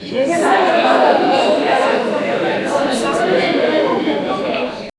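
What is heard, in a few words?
town hall chatter; community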